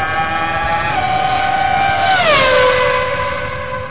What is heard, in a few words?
ferrari